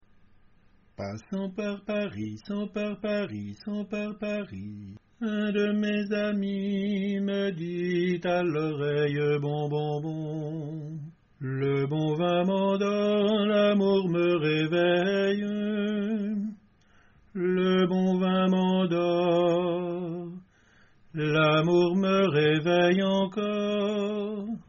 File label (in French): basse; passant par paris